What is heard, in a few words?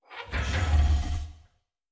lever; adams venture origins; Kismet